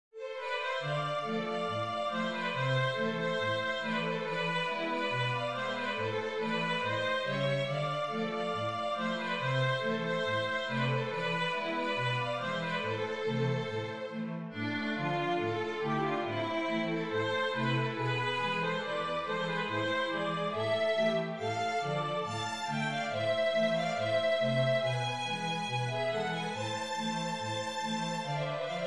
basso, violin

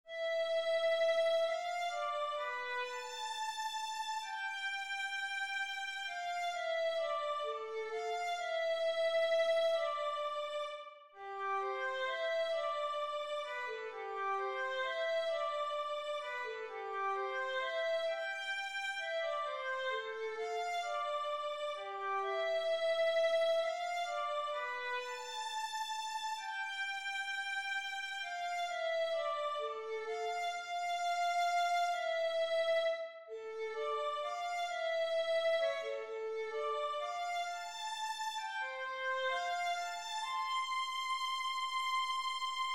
concerto e minor violin

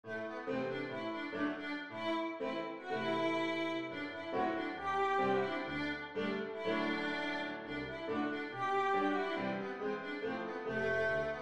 braul full

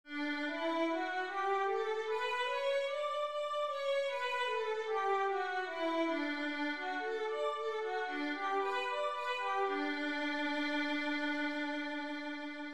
violin D major, scales 1 octave

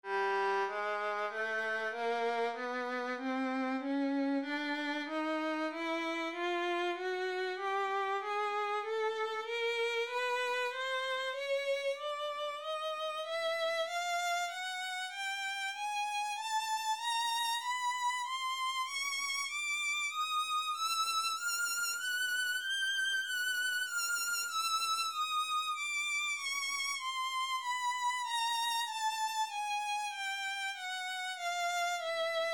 chromatic scale, violin

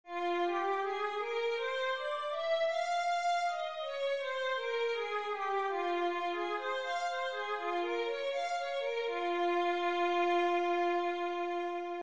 violin F minor, scales 1 octave

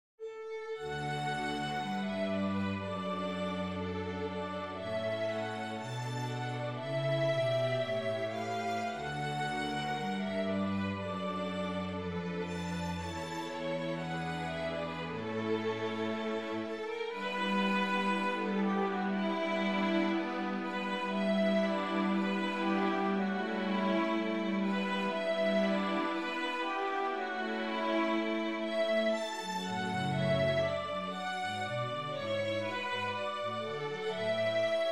concerto b minor full

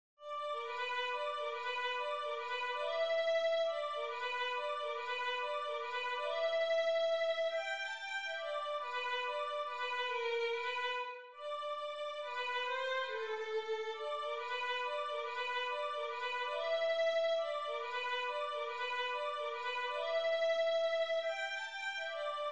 ragtime violin

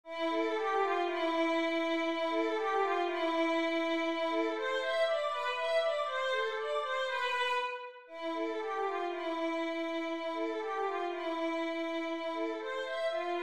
etude, violin

